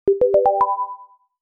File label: Pass speed trap sound